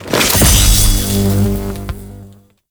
Stance Three Cast; Warframe; Teshin

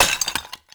Clay Pot Destroy; Gameplay; Awakening Remaster

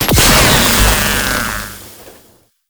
Vaults Drone Destroy; Entrati

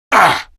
Pain, Male; Warframe; Grineer Male; Battle Barks

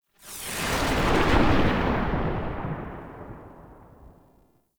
Sniper Tail; Warframe; Sentient; Caliban Prime Sniper

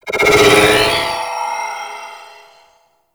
Reckoning Attach; Warframe; Paladin

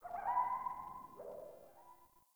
Exterior Distant Calls Happy Calm, Ambience; Warframe; Duviri

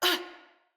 Pain Female; Battle Barks; Envoy

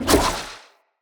Spider Wire Throw

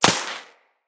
Laser Impact Metal; Weapons; Bullet Impacts; Surface

